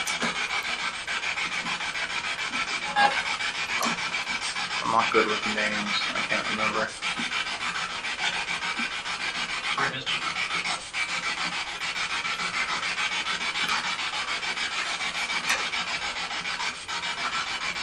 Seance Room Spirit Box; wildwood sanitarium investigation

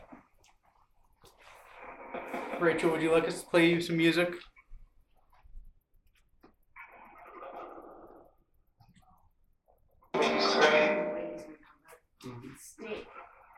Tattoo Shop Phasma Box

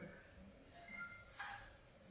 Audio Stationary Cam Middle Room

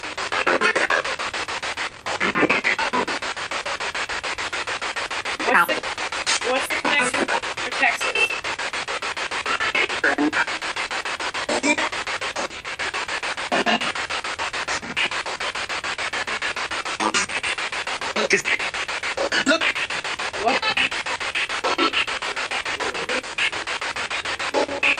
Spirit Box; Hallway; private residence freedom road apartment investigation return

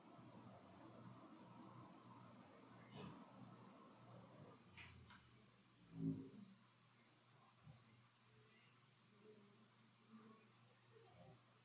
Empty Room

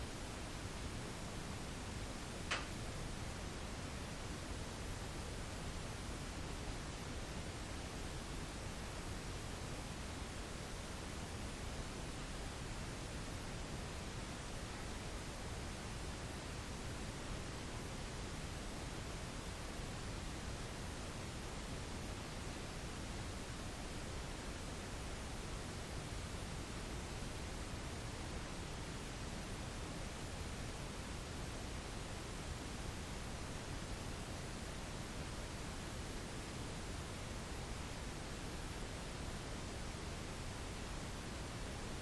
Tattoo Shop Static

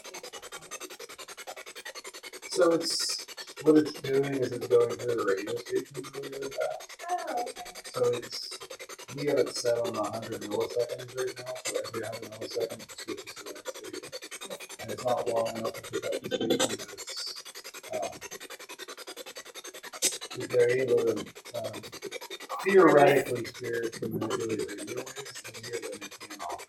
Janitor Room Basement Spirit Box; poasttown elementary school investigation

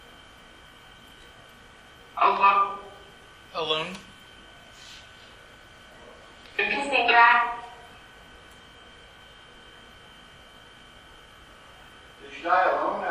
Carriage House Phasma; the castle at mount savage investigation

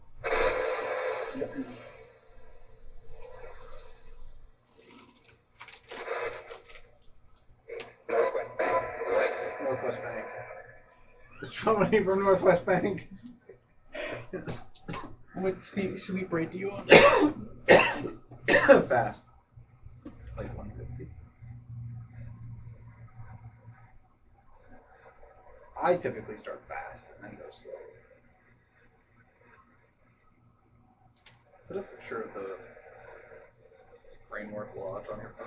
Master Bedroom Portal; ross house investigation; Our communication never seemed to be completely open

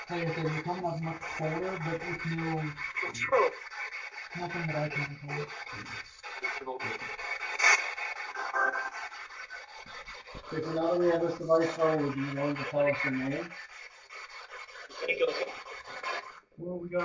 Womans Room Spirit Box; wexford general store antique shop return investigation

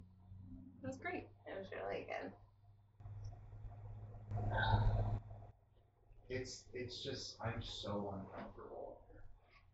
Attic EVP Enhanced; caliente pizza draft house crafton investigation

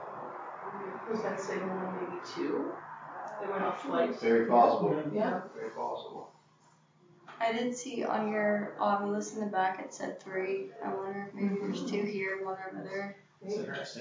Train Station Chessie Room Static; Mars Train Station – Return Investigation 2024

